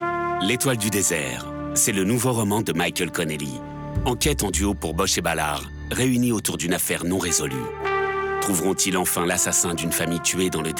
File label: Music; HACHETTE; M. Connely; L'Etoile du desert